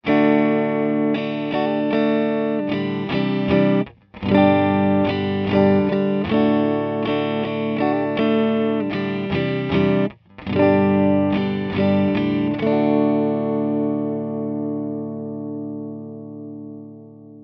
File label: clean